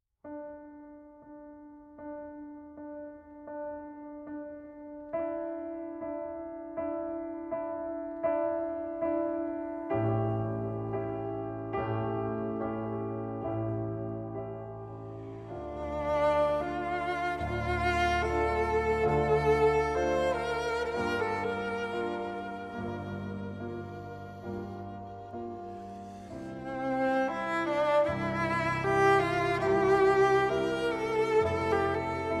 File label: Concerto in D Minor Adagio for Cello and Piano